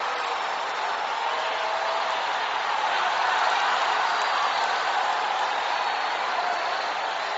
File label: crowd low